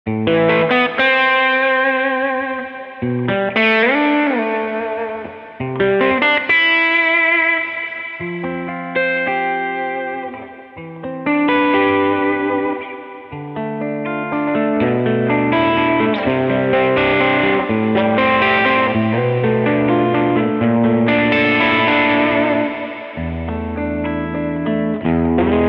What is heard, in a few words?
Fender Strat Blackout Effectors Mantra Boss RV5; Victoria